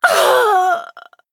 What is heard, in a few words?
Female Human Death